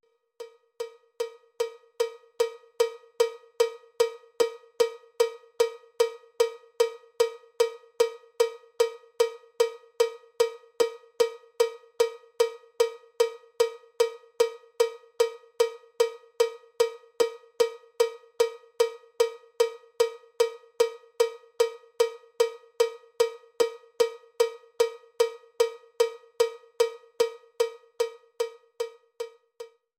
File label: cowbell click track bpm 79; drumtex; cowbell click tracks vol 1